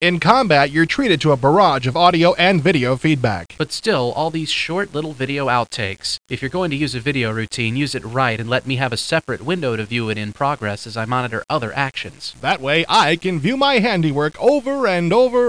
harp

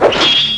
sword git

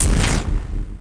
Shield hit, Space Flight; XCOM

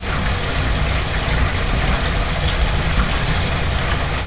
gush; Next Generation DRAMs

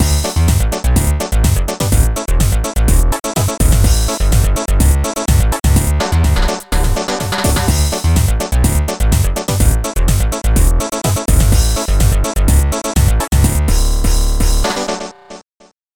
tune; BlitzBombers; Amiga

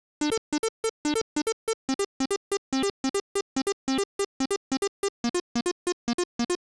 analog

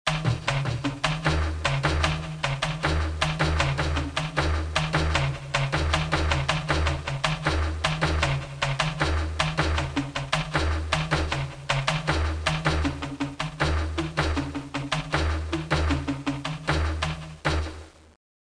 sting

